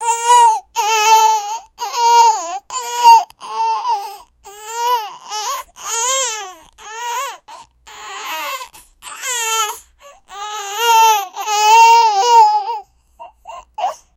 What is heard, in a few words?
growling baby cry